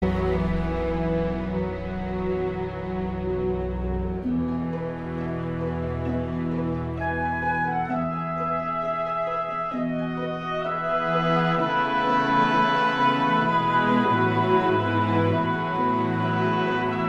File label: musical instruments song ringtone